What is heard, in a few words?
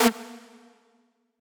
synth